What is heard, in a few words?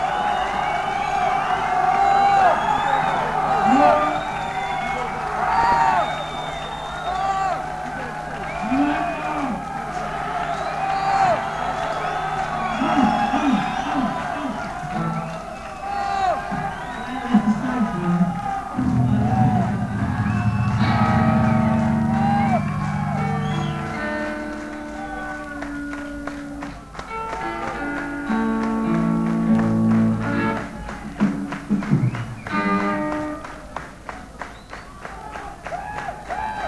Crowd noise; Take It Or Leave It!